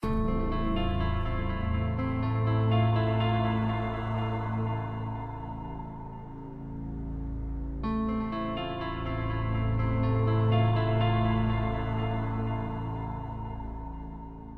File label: BGM Suspense; Tales of the Neon Sea; iOS PS4 PS5 Switch Windows Xbox One Xbox Series X/S gamerip 2019